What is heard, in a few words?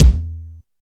wet bass drum single hit e key; kick drum samples